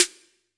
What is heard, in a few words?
Snr, drum